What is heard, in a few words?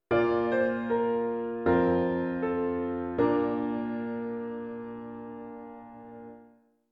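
Piano, Dictation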